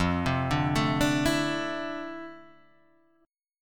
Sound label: F Major 7th Add 6th, guitar chord; FM7add6 Chord {1 3 0 2 3 0} arpeggiated